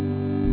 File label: Beep; angels